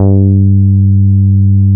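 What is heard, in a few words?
NORD MP G3, bass; NORDY BS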